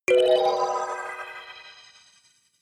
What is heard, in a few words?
notification kalimba magical fourth